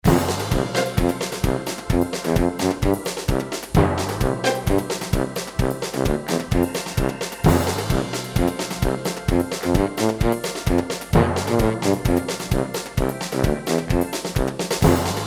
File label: prize pick music; Fluffy Favourites Remastered